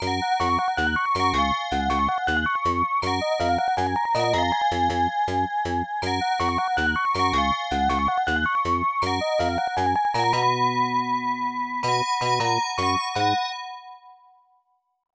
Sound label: Unused Jingle; Xak -The Art of Visual Stage; X68000